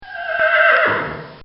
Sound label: Horse Whinny; Horses; Animals; Bobby Cole